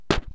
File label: stickfighter attack; propack